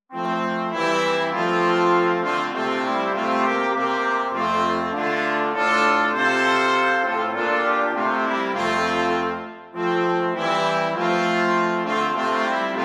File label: brass quartet